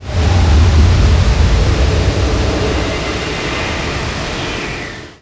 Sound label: shadow eruption